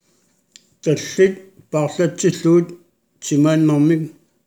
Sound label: Vocal